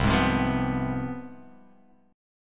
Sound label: C Major 7th Suspended 4th Sharp 5th, down